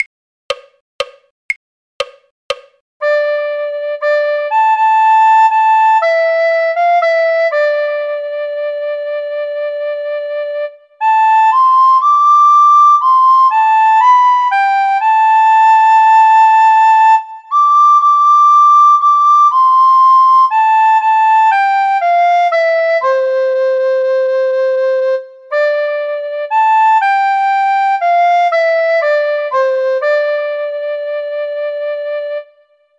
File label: recorder; Scarborough Fair; folk